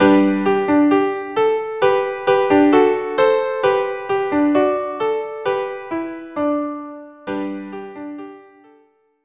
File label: violin duo; Mi yemalel